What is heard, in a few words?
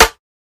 Snares